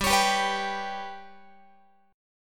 G Major Flat 5th G down Guitar 4A 4E 5A 5D 5G sharp 6C sharp; GMb5; strummed